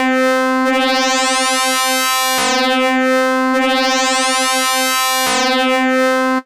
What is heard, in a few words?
out control